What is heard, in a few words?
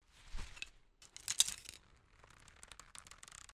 pulling arrow